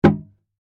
Wood Crate Impact, Foley, Wood, Crate, Impact, Apple, Box; Materials; BlastWave